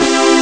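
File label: C Major; rare gems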